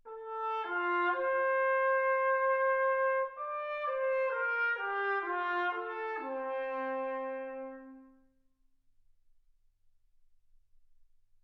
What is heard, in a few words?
trumpet